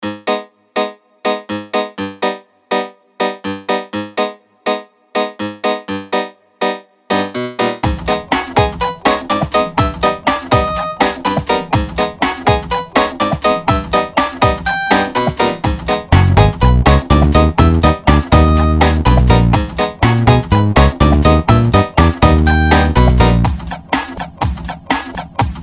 Beat N Bass

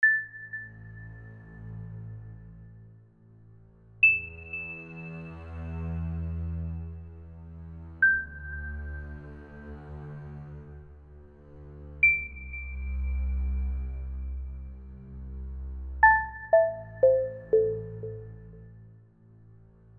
Crystal Cave

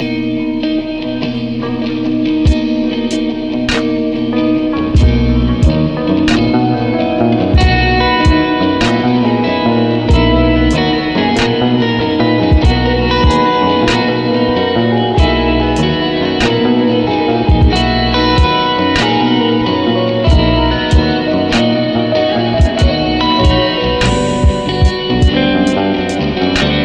guitars

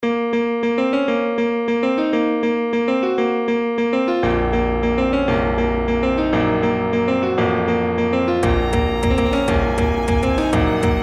Drum

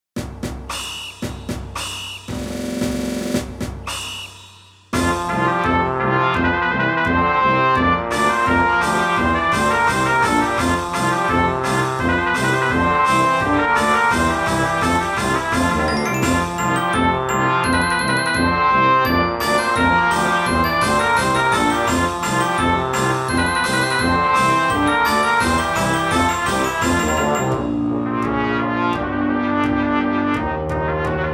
Fight Song; Creepy Castle

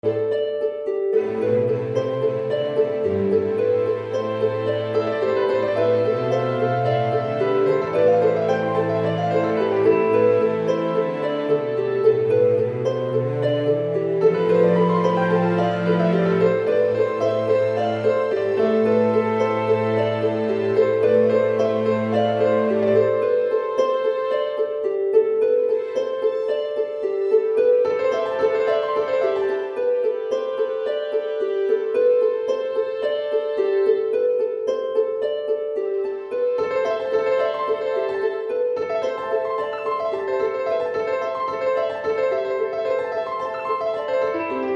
forest of repeated harp playing and sorrow

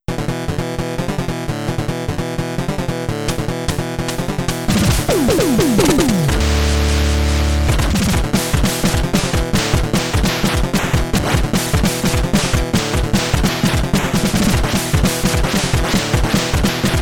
Guitar Noodling

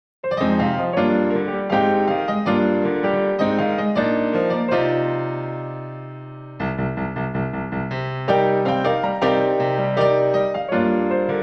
piano; One Hour Compo

